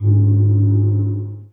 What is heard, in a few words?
TURN; War3; PC Gamer 46